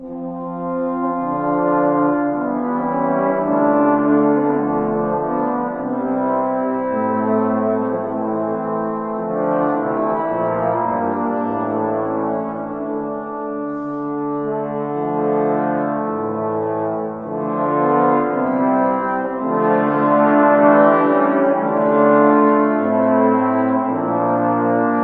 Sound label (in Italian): finale strumentale; Brumel